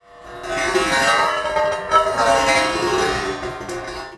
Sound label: Prepared Drums